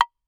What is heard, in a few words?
8.5 wb ff stereo, Woodblocks, Hand Percussion